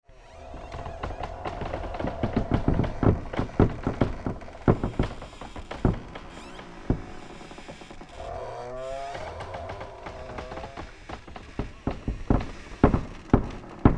Haunted House, Haunted, House, Ambience, Wood, Creaks; Horror, 5.1; BlastWave